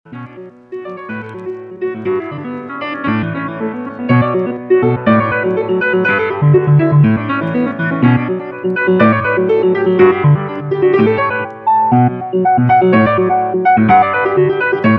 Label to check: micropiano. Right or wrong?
Right